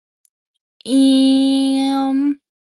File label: errrmm